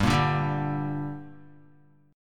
G Minor G down Guitar, chord; strummed; JGuitar

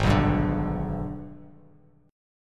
G sharp Minor Major 7th Flat 5th, Bass Standard; strummed; JGuitar

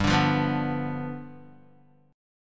G 7th G, Guitar; G7 strummed; JGuitar

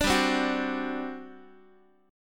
G Major 7th Suspended 4th G, Guitar; GM7sus4 strummed

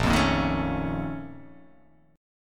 A sharp Minor Major 11th, A sharp, down

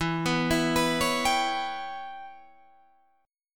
E Minor 6th guitar chord, Open D

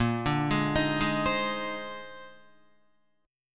A sharp 11th, Guitar; Listen to A#11 arpeggiated; JGuitar

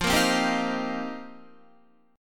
F 7th Suspended 2nd Suspended 4th, Guitar

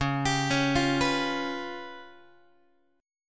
Db 7th Flat 5th Guitar, Db7b5 chord; Db7b5 arpeggiated; JGuitar

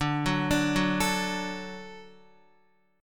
D-Major, D, Guitar, 4F, 4A, 5C, 5G, 5C, 6E; D arpeggiated; JGuitar

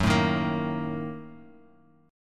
A sharp Major 7th Suspended 4th Sharp 5th, guitar

